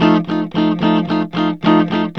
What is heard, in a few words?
ROCK F 110, Vintage Blues Guitar; USB Soundscan vol.22; AKAI